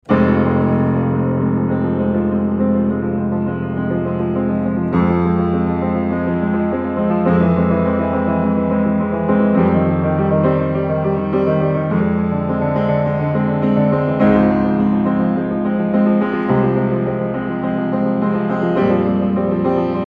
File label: Piano Piano